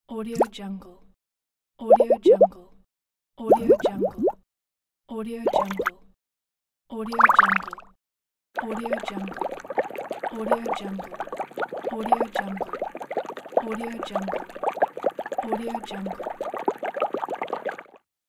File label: bubbles; wavbrulee